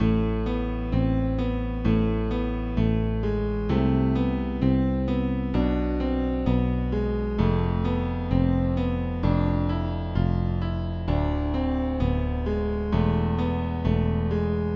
Piano Bass Melody Loop

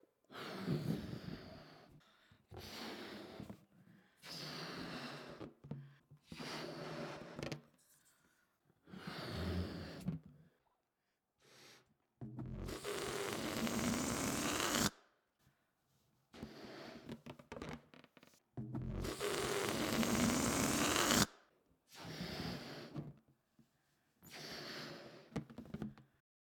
Aerate Balloon

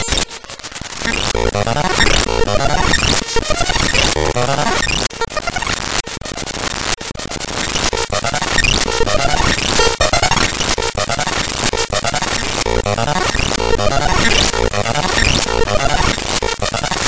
Musical Rewind Glitch

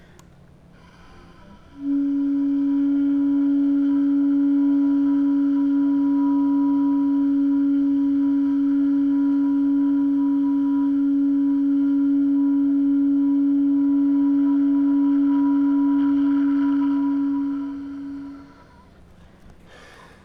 Armonic Medium

